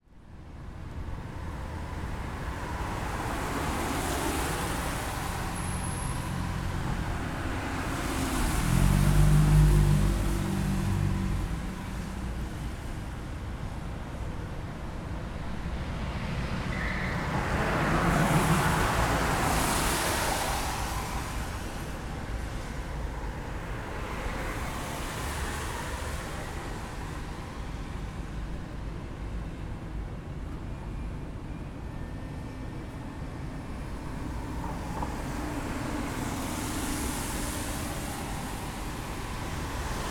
transport traffic city exterior wet road miami usa